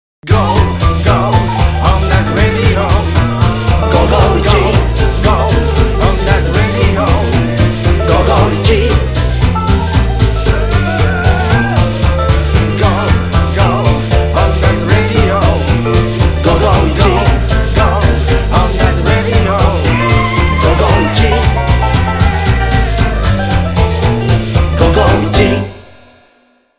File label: jingle long